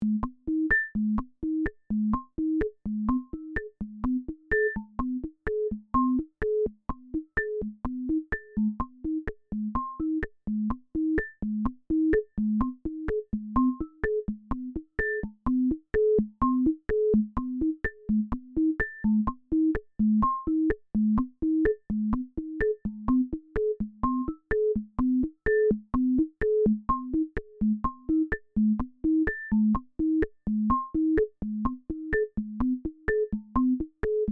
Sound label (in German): 2 gepingte Filter